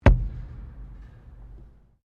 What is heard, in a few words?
METAL IMPACT THUD; Materials